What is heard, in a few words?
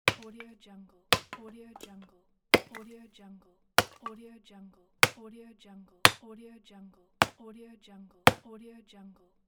axe chopping wood